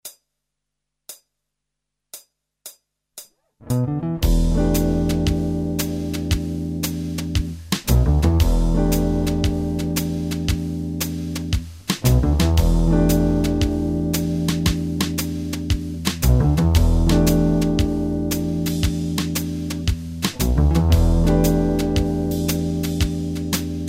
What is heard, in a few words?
Jazz; MyVirtualBand